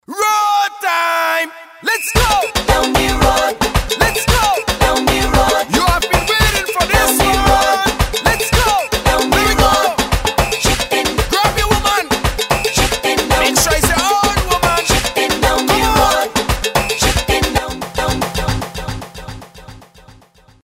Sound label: soca ringtone